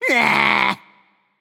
nv tongue; smite; voicepack; hachiman

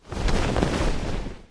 Death Slither; smite; kumbhakarna

